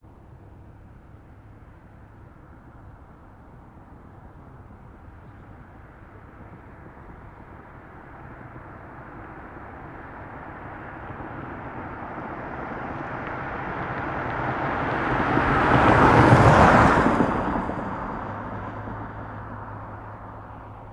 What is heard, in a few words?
Generic Car, Pass By, Fast, Desert, Ext